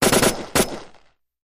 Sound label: M1 Automatic Weapon